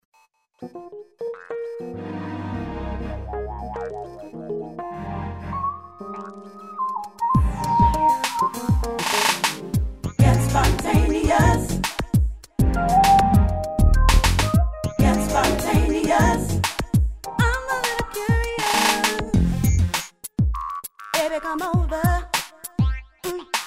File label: Roland FANTOMXA